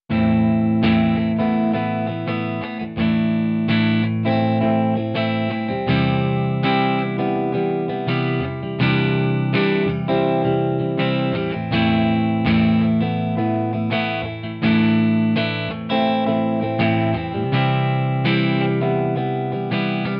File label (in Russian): Rasshirennyj boj vosmerka bez glusheniya